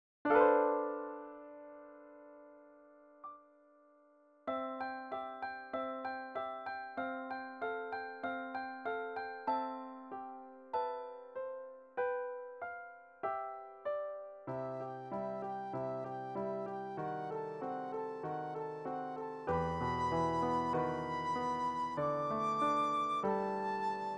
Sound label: Strings